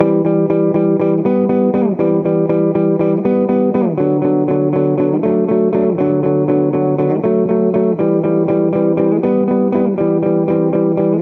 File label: loops electric guitar